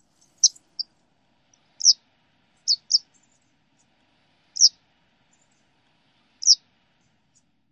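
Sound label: calls, White Wagtail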